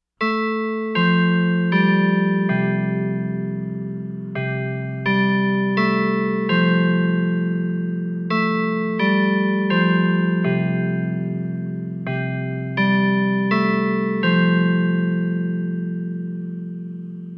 Church Clock Strikes 12 O'clock

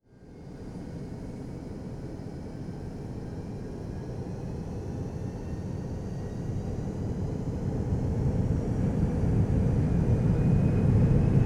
Airplane Jet Engine Revs

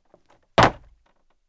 Footsteps Hard Wood Jump

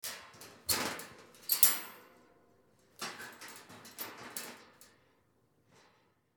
Door Cage

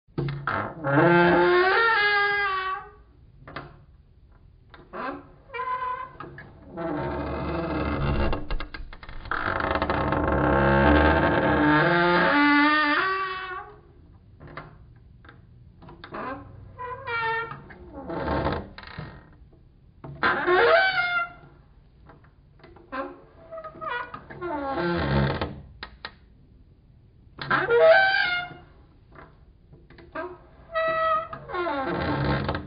Creeking Wood

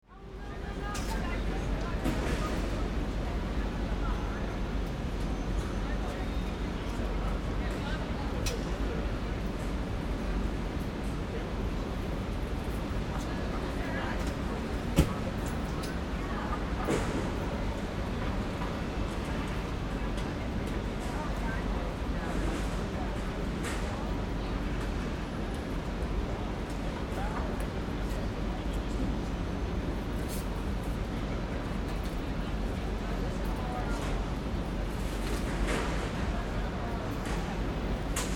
City

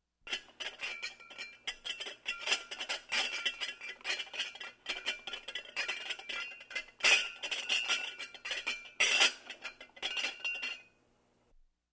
Tray Of Glasses Rattling

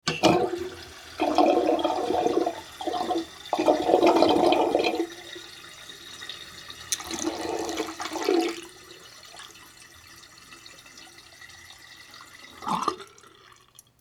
Sink Drain

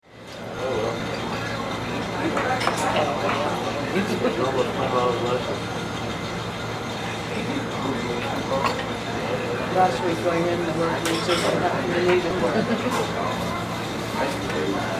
Restaurant

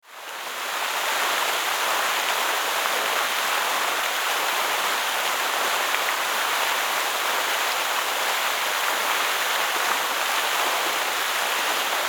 Water Fountain

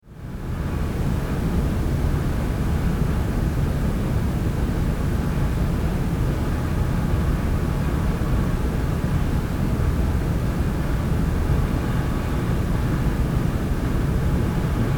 Room Tone

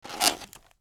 Styrofoam Container

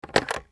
Telephone

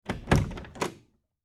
Auto Open Door Exterior

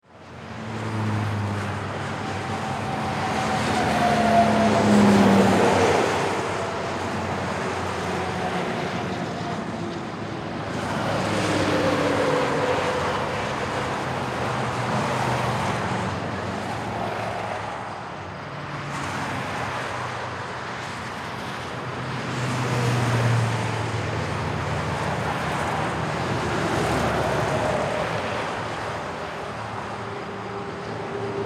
Traffic Highway

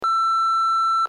Answering Machine Beep

